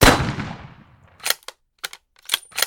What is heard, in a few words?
kar98